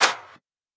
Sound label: bullet impact woosh